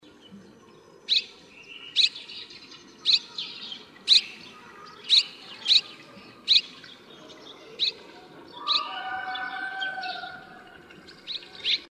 赤腰燕; 燕科/留鳥

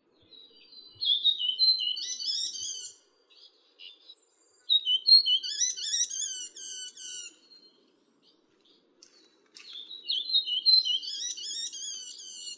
繡眼畫眉; 灰眶雀眉、繡眼雀眉; 畫眉科/留鳥

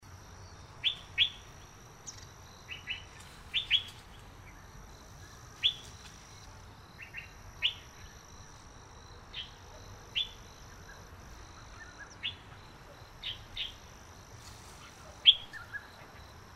烏頭翁; 台灣鵯; 鵯科/留鳥

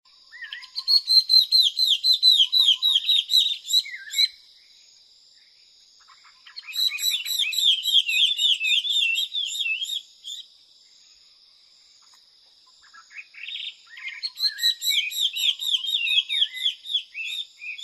台灣畫眉; 噪眉科/留鳥

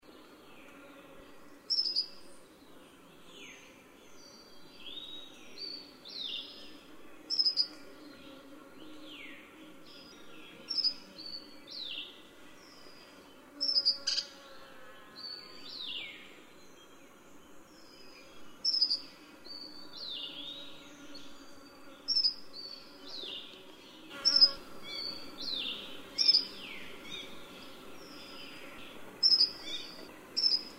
棕面鶯; 棕臉鶲鶯; 樹鶯科/留鳥

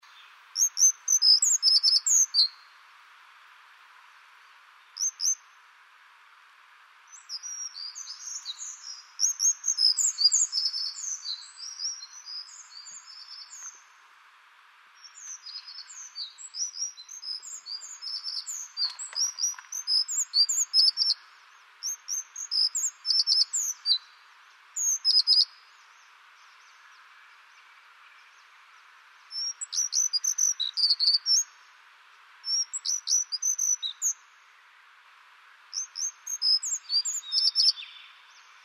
鉛色水鶇; 鉛色水鴝、紅尾水鴝; 鶲科/留鳥

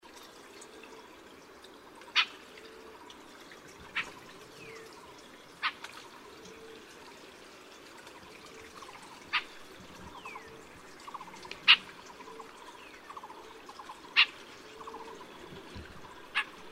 野鴝; 紅喉歌鴝; 鶲科/冬候鳥

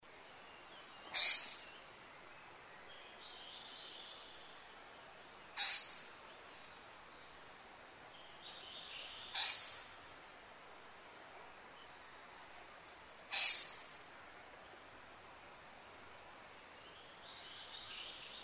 台灣紫嘯鶇; 鶲科/留鳥

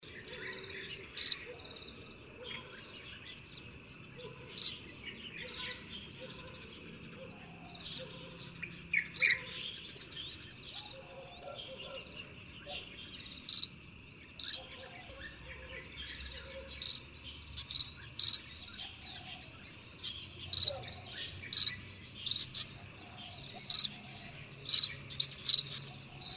白腰文鳥; 尖尾文鳥; 梅花雀科/留鳥

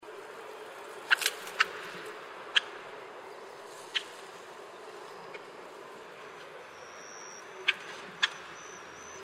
台灣夜鷹; 南亞夜鷹、林夜鷹; 夜鷹科/留鳥

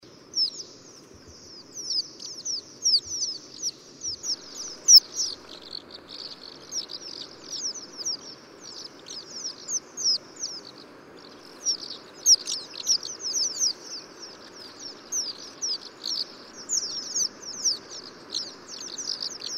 東方毛腳燕; 燕科/留鳥

